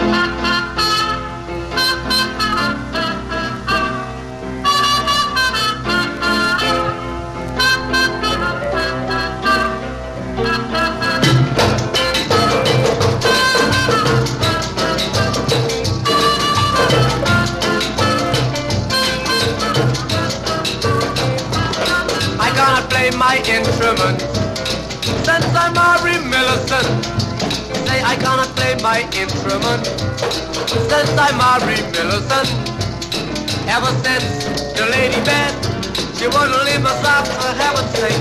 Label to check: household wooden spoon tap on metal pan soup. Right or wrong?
Wrong